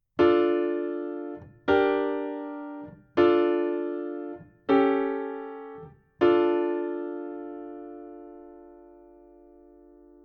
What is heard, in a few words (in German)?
Harmonische Kadenzen in d Moll; Übung 1